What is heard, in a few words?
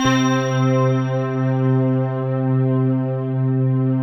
SD1, PAD; Alpine Volksmusik